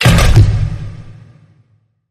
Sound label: Slam Dunk Surge